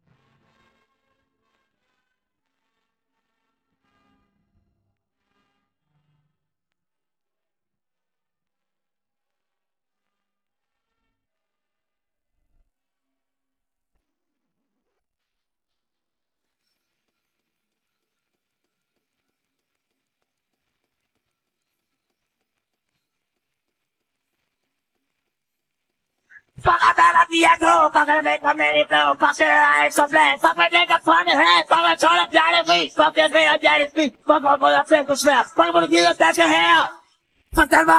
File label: vocals